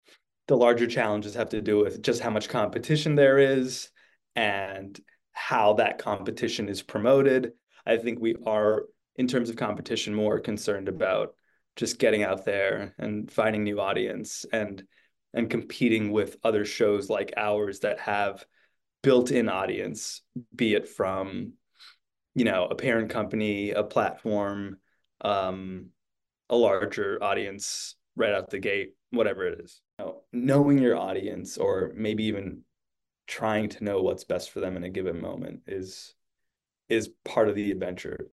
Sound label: audience